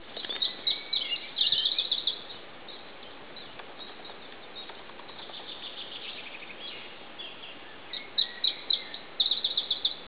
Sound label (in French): pouillot iberique chant